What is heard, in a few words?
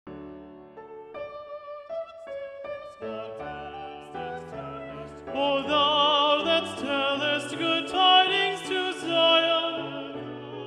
Tenor Predominant; O Thou That Tellest; Messiah; Handel